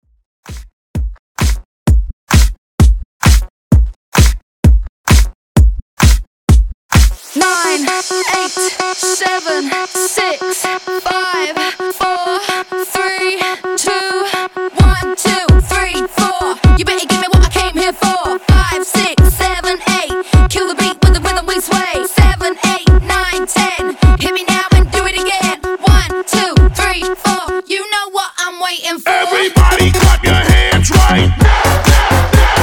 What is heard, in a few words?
Hype Redrum